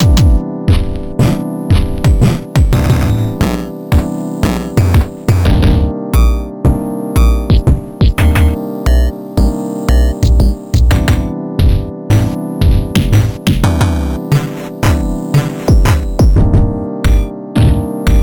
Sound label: bitware kick